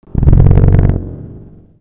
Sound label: pipe; The Elder Scrolls III - Morrowind